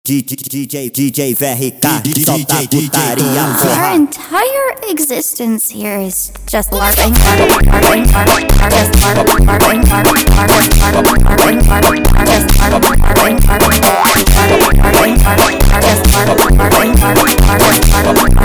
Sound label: Funk